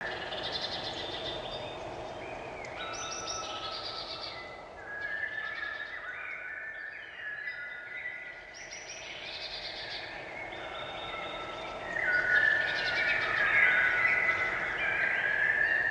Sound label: Wetland Forest Day Amb Loop; Midrath Island; Ambience